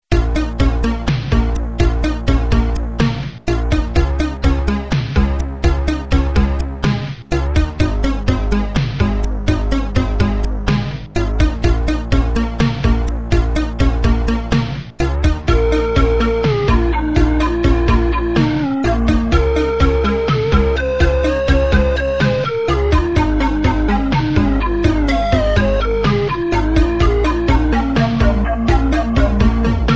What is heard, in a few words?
Theme 2, Amiga; Battle Cars 2; Moonchild